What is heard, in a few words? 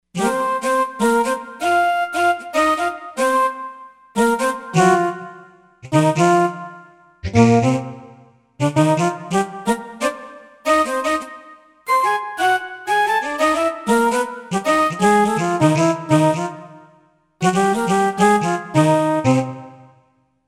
VOX Flute